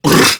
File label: Pain Prime Grineer Male; Tau; Battle Barks